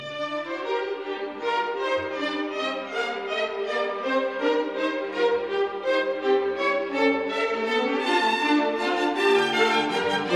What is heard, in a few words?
Finale full orchestra